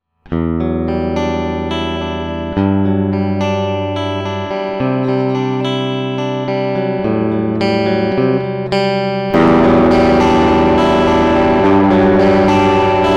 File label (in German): erst clean dann viertel drive; fame tube steamer